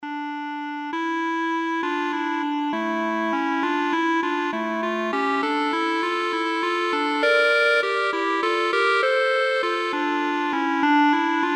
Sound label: Clarinet duet